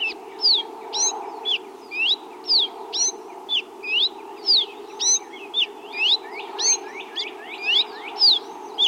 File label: Yellow-billed Cardinal